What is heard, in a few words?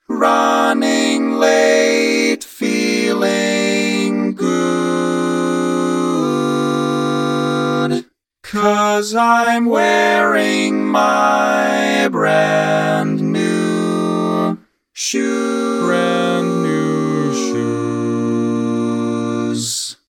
All Parts, barbershop tags